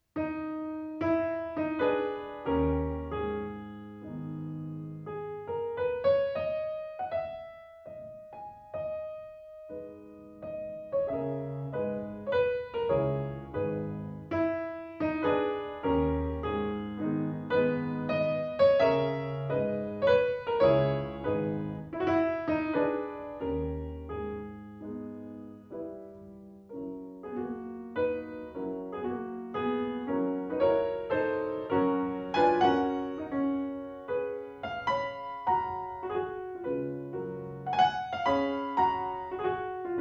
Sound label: Mazurka op. 33,3; F. Chopin